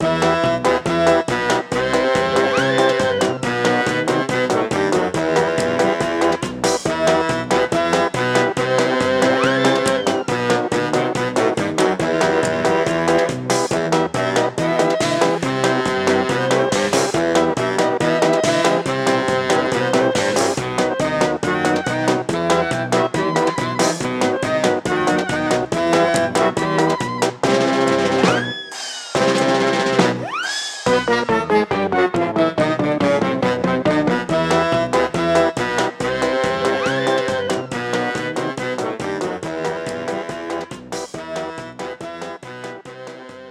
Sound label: Saxy; TMP2; The Jackbox Party Pack 6; Jackbox Games